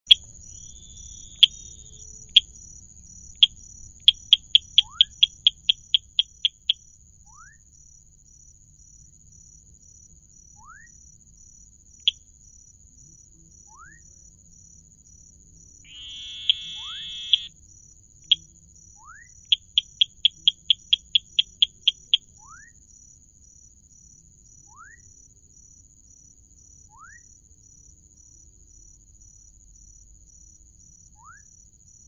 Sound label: Dendropsophus rubicundulus